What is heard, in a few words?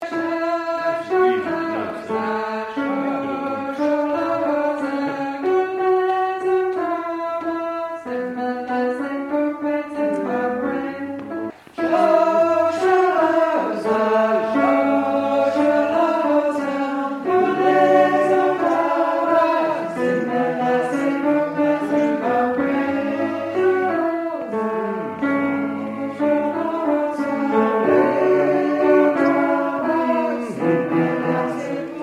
alto